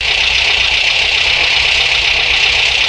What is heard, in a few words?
Jeep Idling